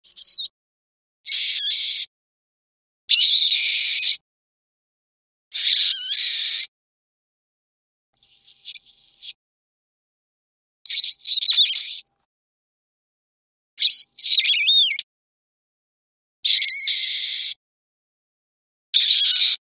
tieng doi

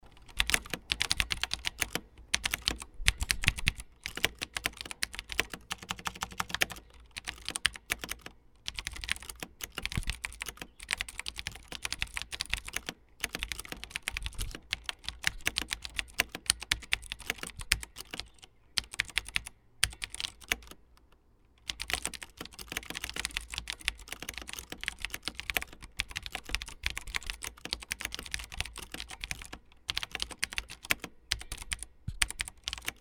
tieng bam ban phim lien tuc khi choi game

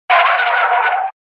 hiệu ứng âm thanh thắng xe; phanh xe

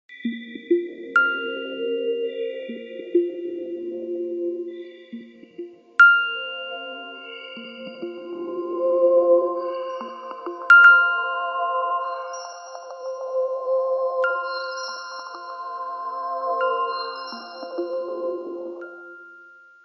nhac chuong dien thoai iphone 8 mystery sms

